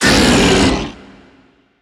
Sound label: beast vocal fire barf